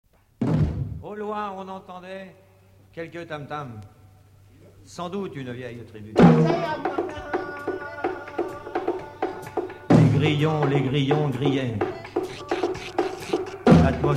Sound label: jingle; scalp